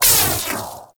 Sash Impact; Warframe; Nezha; Armillary

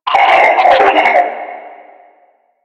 Death; Warframe; Lasrian; Lasrian Enemy B

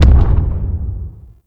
Distant Step; Enemies; Steps; Warframe; Corpus; Arachnoid Camper

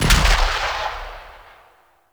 Weapon Punch Machine Gun; Heavy Weapons

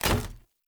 Short Sword Medium Impact Wood Hollow Large

